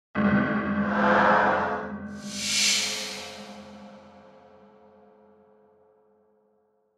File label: Hit Distortion Whoosh Left To Right; Movement Production Element Imaging Element Accent Transition; Blastwave